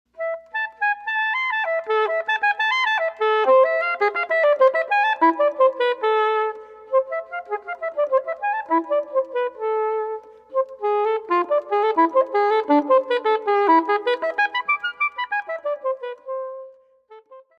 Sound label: flute